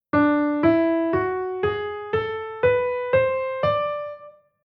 Chord mode Lydian b7

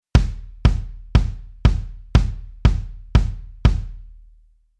Rhythm Basic Velo Kick

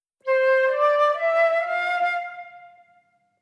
legato

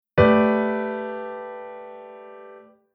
Chord Hybrid; Jissen

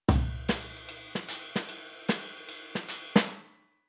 Rhythm Modern Jazz

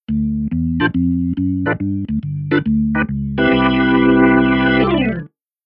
Keyboard Organ Drawbar

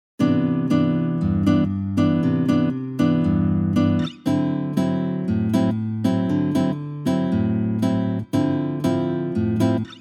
guitar

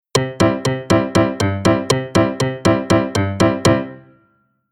Rhythm

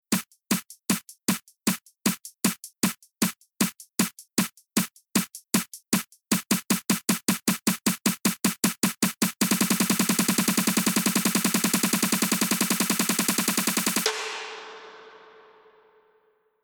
Future Bass Rhythm SD Non Pitch

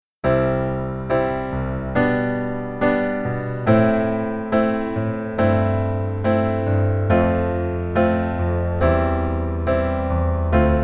Keyboard Chord

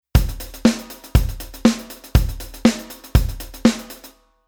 Rhythm 16 beat Basic

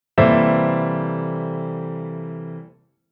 Chord Poly; Jissen

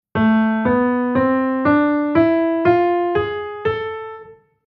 Chord Scale Harm Min

